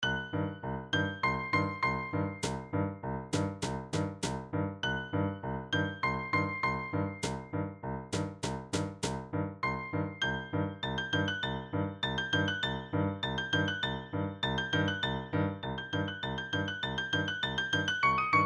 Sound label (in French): 2ème thème piano; jeux; IVème mvt